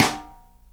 snare GLASS, Live Snares

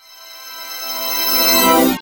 organous, organous sweep; Spectrasonic Distorted Reality 1

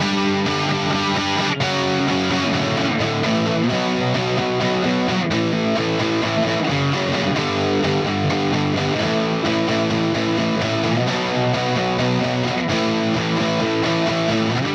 Guitar, Indie Rock; Sonic Foundry (Sony Creative Software); Indie Rock Anthems; Session 10 - Roswell

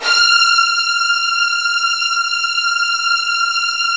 F3, Virtuoso Strings; ILIO; Kirk Hunter Virtuoso Strings VOL-1

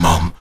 VOX MUM E 2E, Afro Chants; Roland LCDP11 Africa VOL-1; Stacc Mums

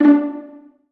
Via Pzz D3; Digital Sound Factory Virtuoso

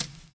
BRUSHING; Univers Sons

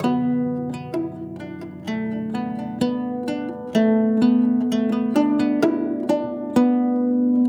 Traditional Guzheng; Continental Drift Aftershocks

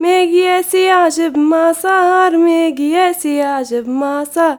GIRL, GIRLVOICE, Arabian Traditions

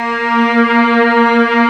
Textural Strings

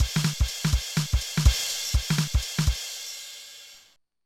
Loop, mxr; classic machines

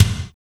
kick gate, ambient kick; Roland L-CDX-01; KIK Ambient K1

